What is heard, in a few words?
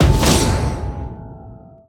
Ui Player Joins